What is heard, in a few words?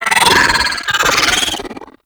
Hyena Cinematic Vocal; Warframe